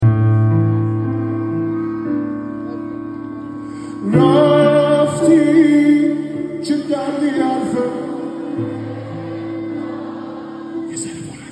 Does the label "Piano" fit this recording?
Yes